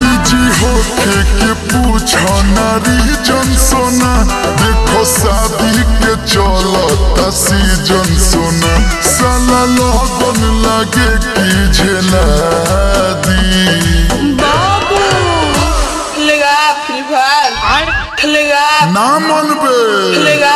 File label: Ringtone, slowed and reverb